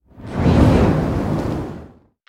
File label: Fireball Pass By Slow